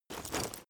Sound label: leather metal walk fast single